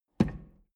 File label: drawer medium close lock